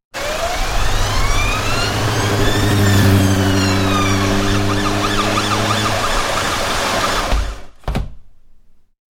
Shutting Out City Noise, Double glazed window shuts out noisy city ambiance.; Household